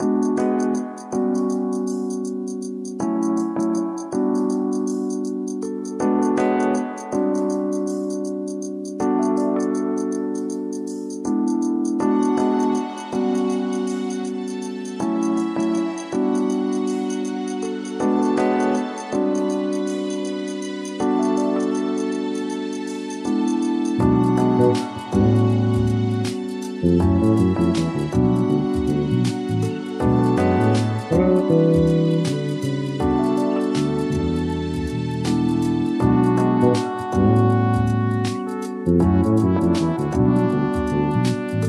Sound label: smooth jazz cafe